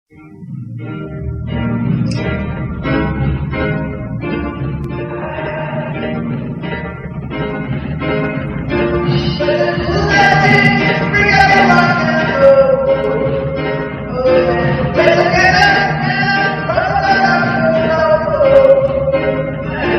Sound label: Reggae Song; Howard The Duck